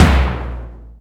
TOM, House Side Of 2 Step

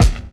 DR. LOOP; X-Static Goldmine 3

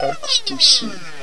Vox, Trance

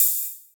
ride clean, cymbal

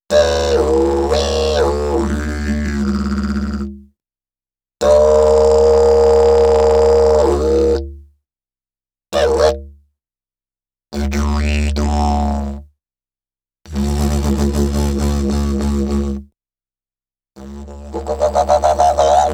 Didgeridoo And Overtone; Good Stuff Audio

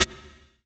trip hop snare; Trance; Drums; Snares; Trance Explosion Vol1

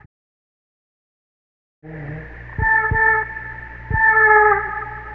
Vocal FX; Sonic Foundry; Sony Creative Software; Stylus Pressure Urban Grooves on Digital Wax; What Up

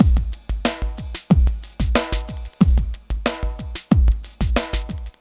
Acoustic Flow, Processed Percussion

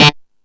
Killer Synth, E-MU Pro-Cussion; drum machine

